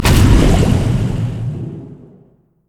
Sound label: tidal wave; nami base